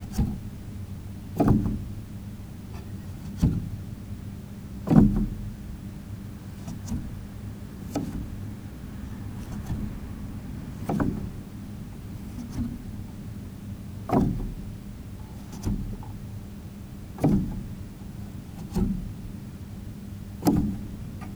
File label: Hammer Rub, Piano; Piano Miscellaneous